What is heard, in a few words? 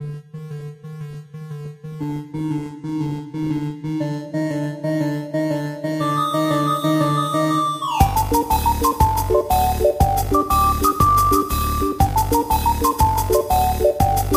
pirate fairground drunken marching band